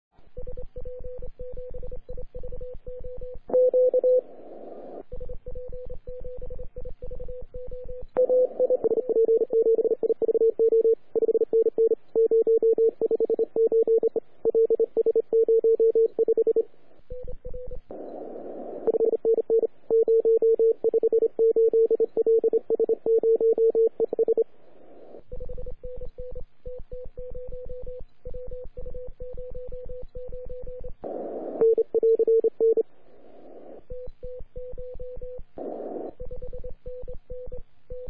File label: CW 3,5MHz; SP3MEP de SP7IVO; euday 2017